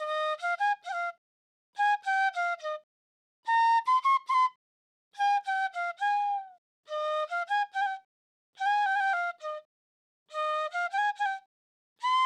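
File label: Trap Flute G major 140 BPM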